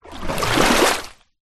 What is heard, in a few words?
nature water movement